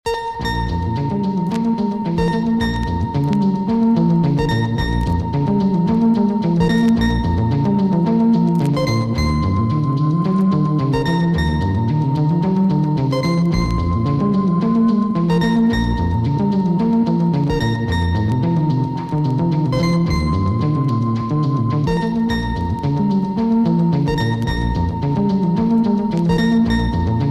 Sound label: jazz cellar